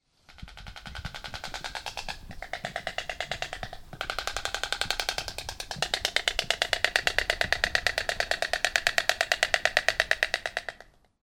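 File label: sounds of stork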